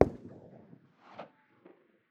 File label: rev dist; weapons